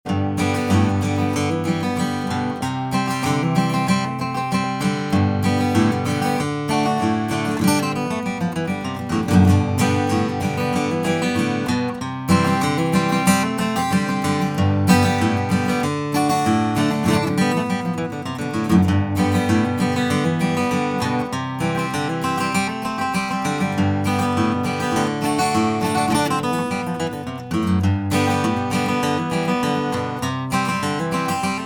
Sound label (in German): Gitarre, Neumann V476b